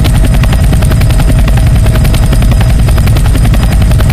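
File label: loud helicopter loop; vehicles; Left 4 Dead 2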